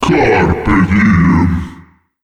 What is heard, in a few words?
wizard spawn